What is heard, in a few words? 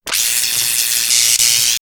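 Magnetic Field, electronic fx; X-Static Goldmine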